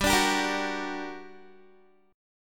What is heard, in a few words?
G Minor Major 7th, down, Guitar; GmM7; strummed